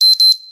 Beep Beep Very short

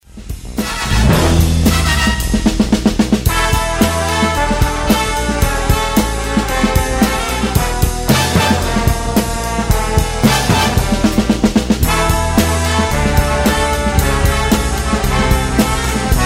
Western Evil

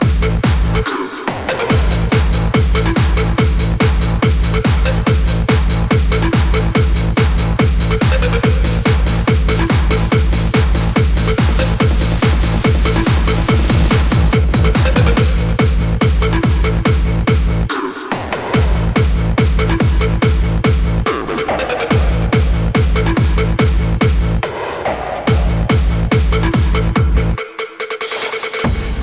Hardstyle

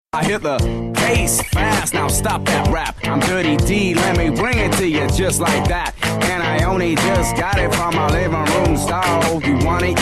Hit The Bass

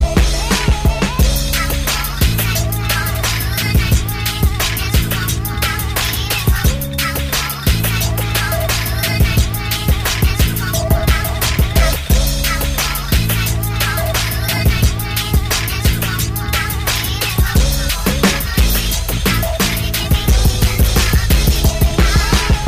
Bg Inst

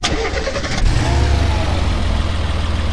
Pick Up Engine

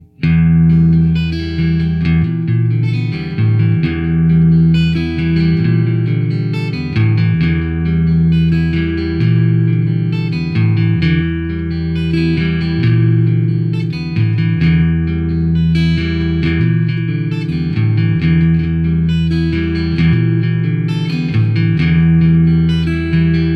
ALARM

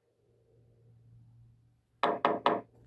Knock

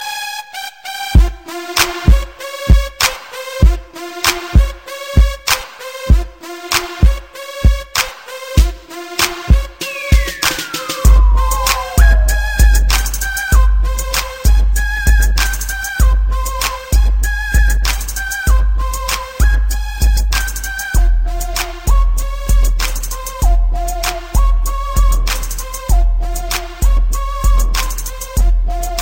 Crunk Beat

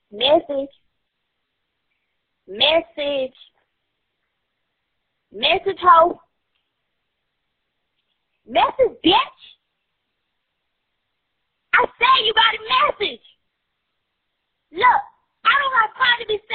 Message

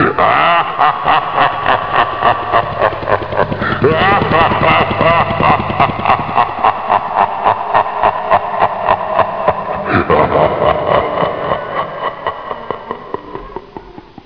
Laughing

